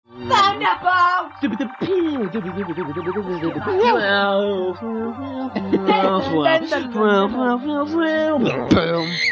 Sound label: Thunder